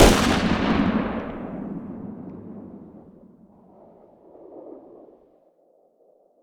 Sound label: fire dist 50ae pistol ext; gmod; arccw uc commons attachments